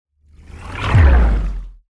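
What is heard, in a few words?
water underwater submarine fast, whoosh; transitions and movement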